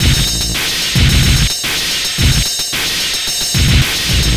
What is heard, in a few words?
Noise Beat; Drums & Drones